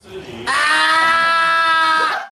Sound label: Scream; Changbin